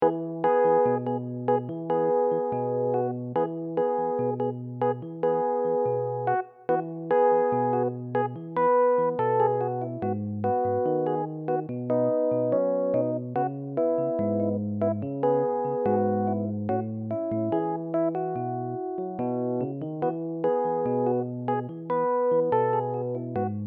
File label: Sunscreen Samba; GPP vol.02